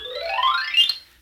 whistle up xylaphone

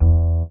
faux upright, Bass

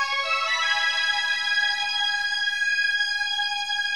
pizz; Loops; Electronic

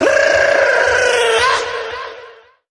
brrrrrr, Voice

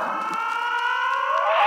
scream in an up; Voice

